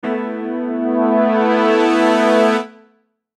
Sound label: Logo Swell Piano Brass; Sonic Logo; Blastwave